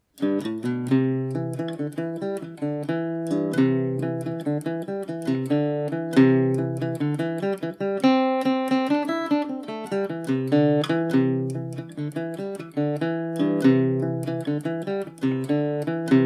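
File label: C major, Irish mandolin; hole in the hedge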